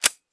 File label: slide release, weapons; glock; cstrike; csgo